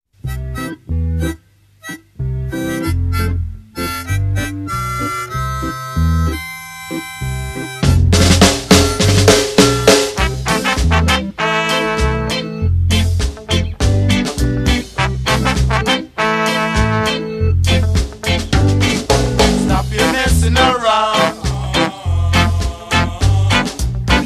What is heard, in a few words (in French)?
Bruitage Musique De Cirque